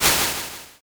swoosh

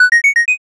sparkle

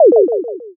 poyon

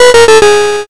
lose

